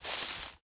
grass step; Golden Crayon Sounds

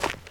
dirt step; Original TK Sounds

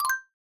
Container Touch; quinton's new sound scheme

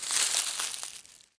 leaves step; Golden Crayon Sounds

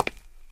tile step; Golden Crayon Sounds

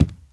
carpet step; Golden Crayon Sounds

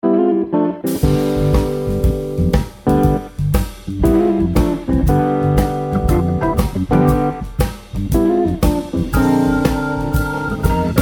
main music; RS Games Sounds; Blackjack

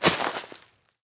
brush land; Golden Crayon Sounds

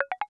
Drill Out; Mac VoiceOver Sounds

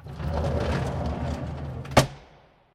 door close; Golden Crayon Sounds

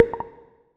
pop up button, Reverb

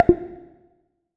check off, ChromeVox Earcons, Reverb

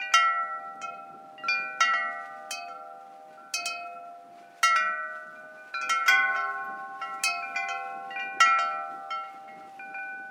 windchimes; Golden Crayon Sounds

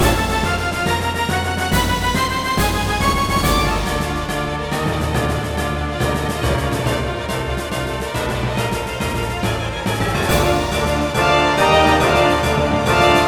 8bit battle

